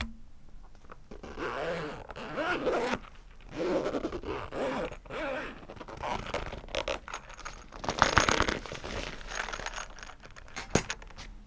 reisverschluss; roland r-26

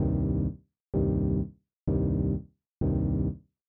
subtraktive synthese resonanz; Subtraktive Synthese mit Bitwig Studio – Tutorial

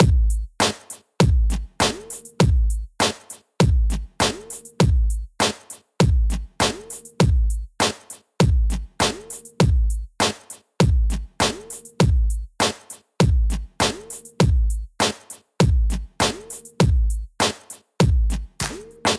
beat mit paralleler kompression Fairchild

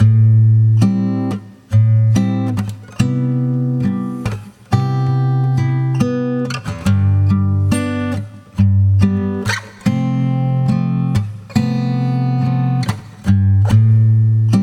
brainworx bx console acoustic guitar 1 instanz

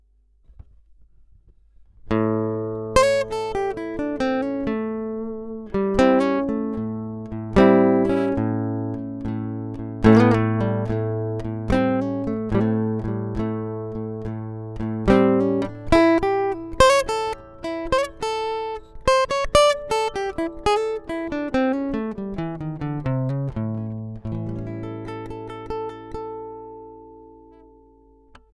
HarleyBenton GS Travel E Mahagoni; Harley Benton GS-Travel-E Mahogany

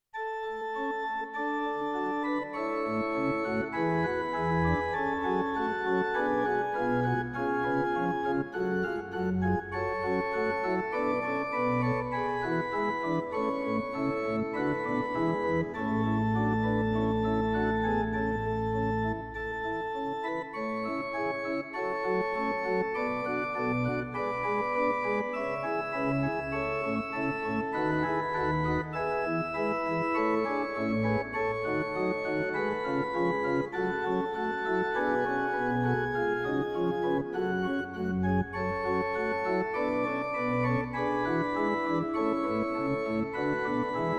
Yamaha P-255 test lang

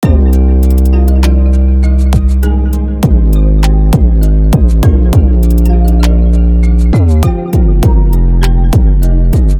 beat mit drill 808; Der ultimative 808 Producing Guide

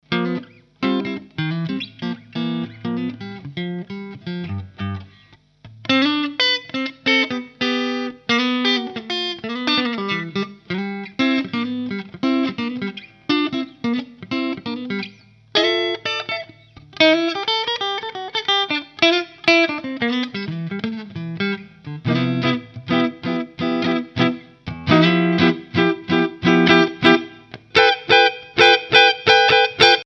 Harley Benton TE-70 test hals clean

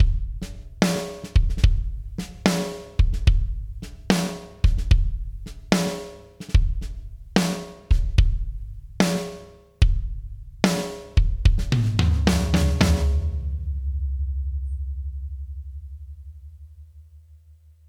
dbx 676 kick snare tom eq comp overeasy stark

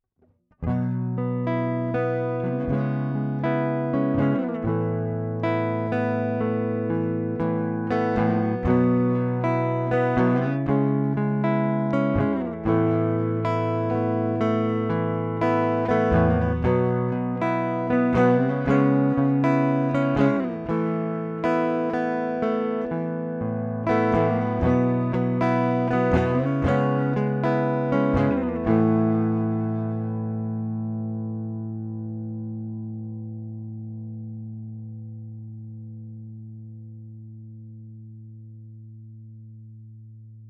e-gitarre

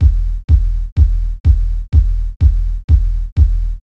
transienten wet sustain

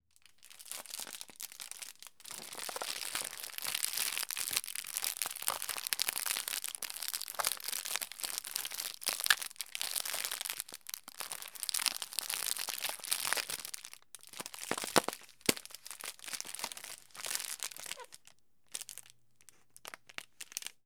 stereoknistern; tascam dr-22wl

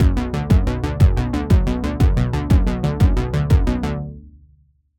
arpeggiator triolisch

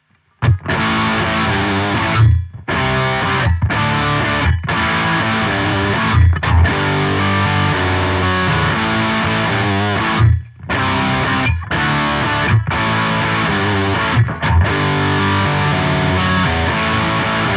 gitarre chuggin; presonus ampire xt metal pack test

